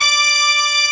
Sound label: brass D5; AKAI S6000 CD-ROM - Volume 1; BrassWind